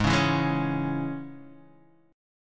G sharp Suspended 4th Sharp 5th Guitar Standard chord, down; strummed